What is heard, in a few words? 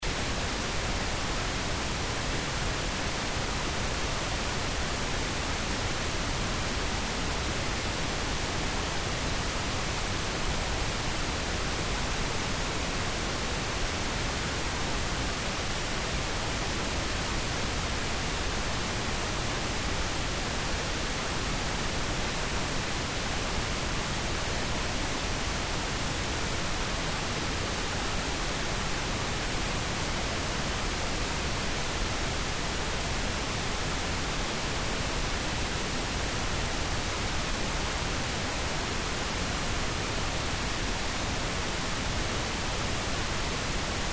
pink noise